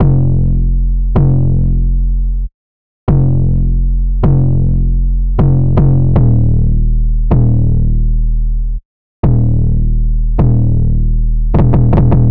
808 plugin on; analog glow; tonalmark

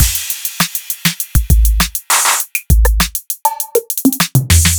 acoustic 808s kit; by uppercussion

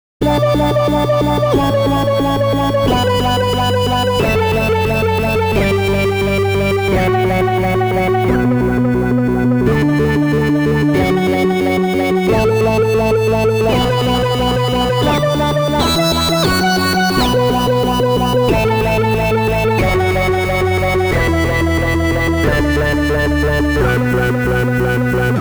hybrid seq; the digital life

